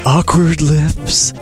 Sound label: awkward lips